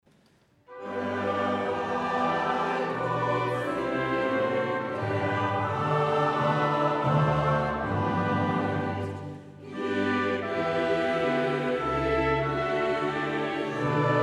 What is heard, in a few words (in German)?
Choral Erhalt uns in der Wahrheit; BWV 79; Kantatenkonzert